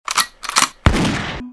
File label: mole attack